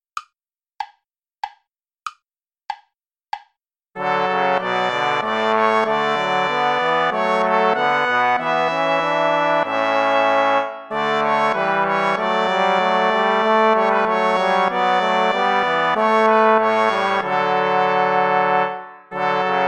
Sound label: play along without trumpet